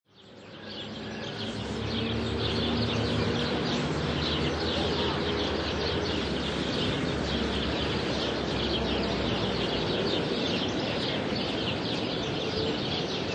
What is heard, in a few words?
Farmland Ambience, Many birds, distant farm vehicles and general farmi; Very faint voices; Lynne Music